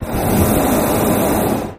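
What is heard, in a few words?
Hot Air Bllon Burn Me, Hot Air Balloon Burner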